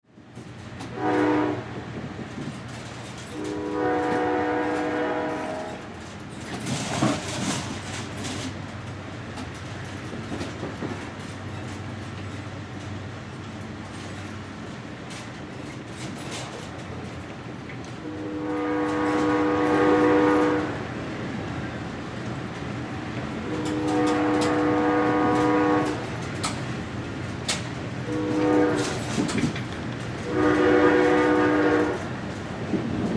Train Passenger, Train Passenger Interior Ride Rattle Whistle Blow Multiple; Transportation; Train Sound Effects; Blastwave